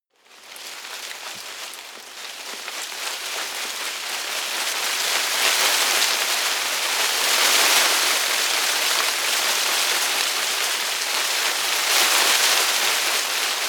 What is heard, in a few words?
tree small leaves rustle heavy